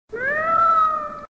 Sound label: Cat Sms Cute